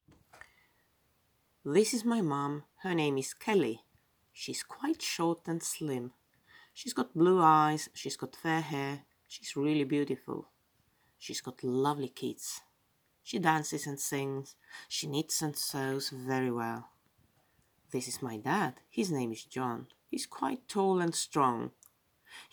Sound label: family chant